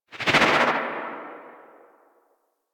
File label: Arch Wing Atmosphere Flyby Right, Vehicles; Movement; Warframe